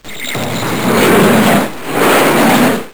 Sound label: Teleportation; Saban